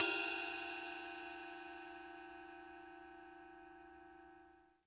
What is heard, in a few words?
CYM Ride; Best Service Gigapack 2